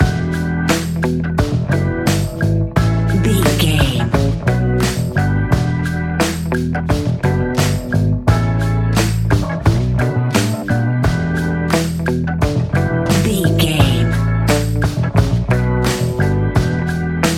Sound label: road trip loop; Video Game